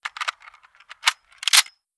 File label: gep gun reload; deusex